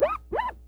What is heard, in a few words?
SCRATCH, Bass; EFX SCRATCHS